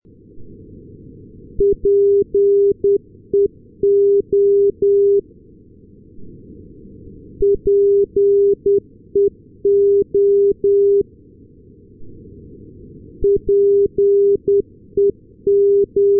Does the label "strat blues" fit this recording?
No